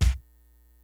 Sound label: GREASY KIT, DRUMS; Kid Nepro; Elite